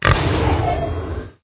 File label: Clicker game - Piggy - Reset Sfx